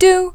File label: UOW, Vocal Samples